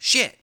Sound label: hurt; rlcraft-1.12.2; playerbosses